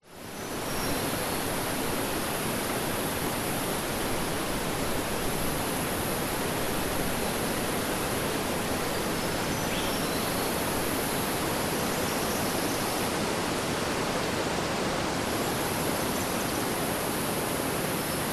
nature forest ambience crickets birds eastern whipbird wind trees flies australia; zapsplat